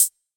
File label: Short Hat